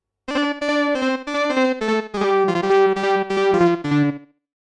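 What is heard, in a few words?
Moog Lead TAPE ECHO Stereo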